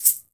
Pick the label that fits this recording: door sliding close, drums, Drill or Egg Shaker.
Egg Shaker